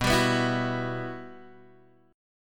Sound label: G Major 13th B